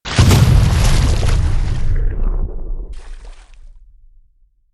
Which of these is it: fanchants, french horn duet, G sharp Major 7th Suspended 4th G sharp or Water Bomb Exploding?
Water Bomb Exploding